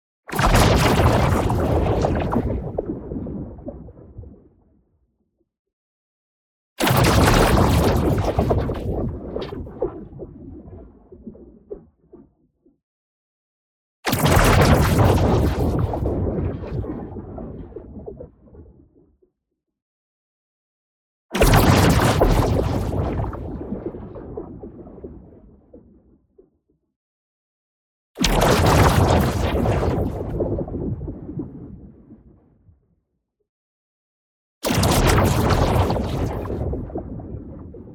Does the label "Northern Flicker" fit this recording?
No